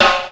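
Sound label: snare jungle, Yamaha Motif ES drum machine; 300 Drum Machines; Yamaha Motif ES-Series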